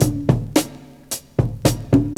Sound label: ROCK 109, loop, BPM; 100-114 BPM